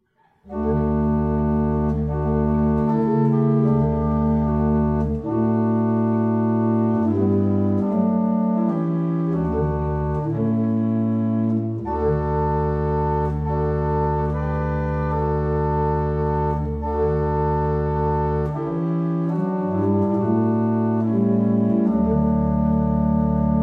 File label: Double chant, barrel organs; Staunton